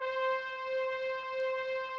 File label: Instrument